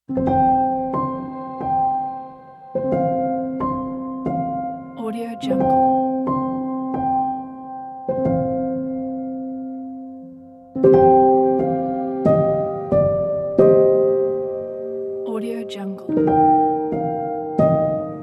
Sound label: ambient emotional sad background piano and cello